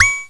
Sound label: Menu Select; Select a menu option; zelda